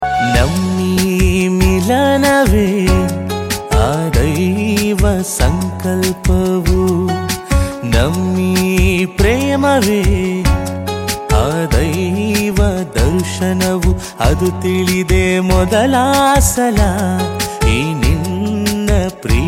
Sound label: Kannada Ringtone